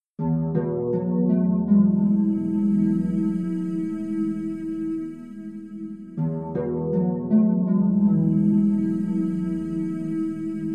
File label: vocally 432 hz